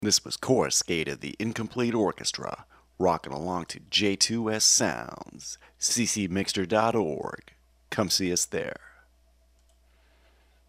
Anime 90BPM

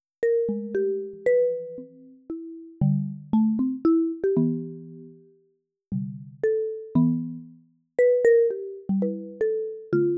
marimba

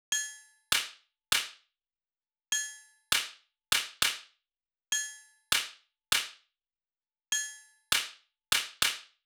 Triangle; bblohowiak

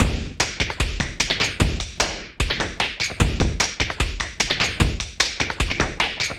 Space Break

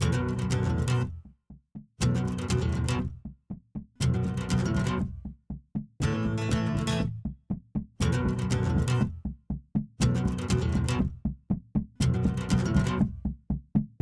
Ambiance of Uncertainty; Whitewolf225

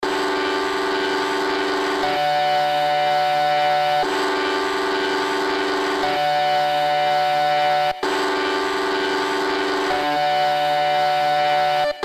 Retro Synth Chords

